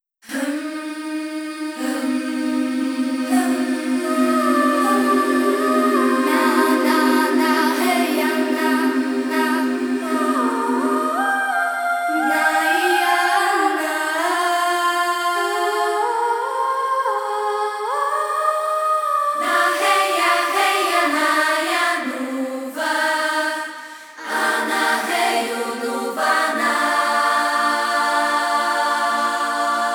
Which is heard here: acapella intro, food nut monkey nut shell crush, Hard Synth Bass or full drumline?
acapella intro